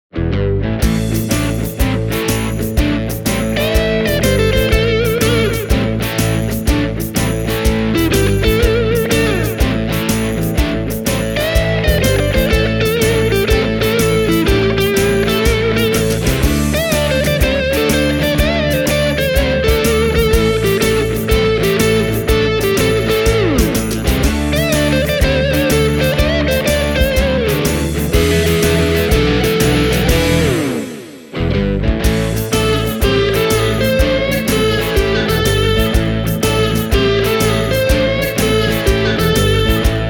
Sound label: dual tone style; rautia guitars